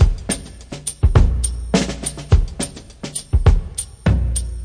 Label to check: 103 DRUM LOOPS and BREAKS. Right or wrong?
Right